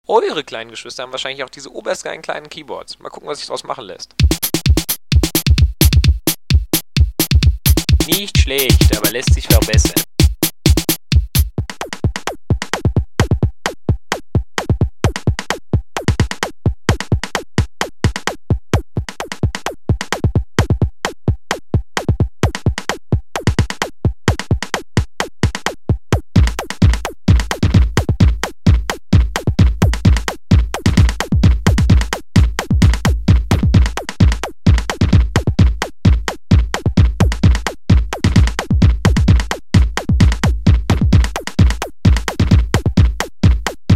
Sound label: keyboard; Total Kaput 1997